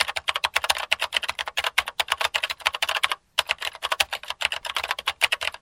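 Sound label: clavier, keyboard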